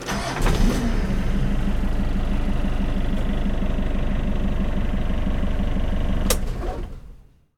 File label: engine start; 1000fx